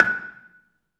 BELA2 F#5, BELAFON, Asian Traditions; USB Soundscan vol.60; AKAI

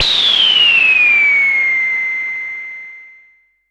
Synare 3, Filtered Noise

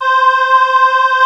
C 605 CHOR, Yamaha C605; TECHNO-BWARE

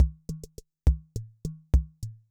rhumba, Yamaha MR-10

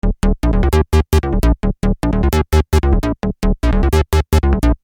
Syn Bass 150 D#, Techno Synth Loops; SynBs; 150bpm; Sonic Foundry (Sony Creative Software); Signals I

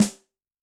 Rock, Yamaha DTXpress-II snare

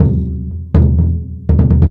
Percussion Loops, Brazilian; Houseworx

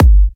G, Kicks; markus hakala

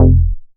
bass classic house F; Polyend; ARC Noise

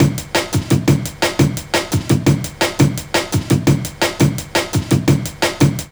LOOP, Jungle

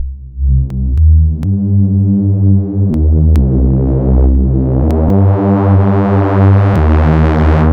jungle warfare C 124, synth loop; Synth Loops; Zero-G; Soundclash Nu Dance Electro; Acidized; 124bpm

